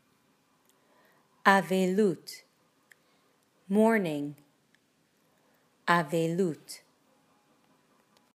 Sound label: mourning